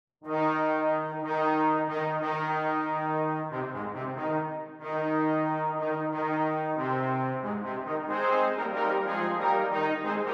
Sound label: FANFARE TRIONFALE